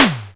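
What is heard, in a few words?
snare drum, Simmons SDS8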